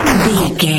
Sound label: retro explosion big